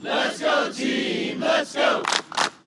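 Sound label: Ambience Baseball Crowd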